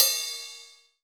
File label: RIDE, ROCK RIDE CYMBAL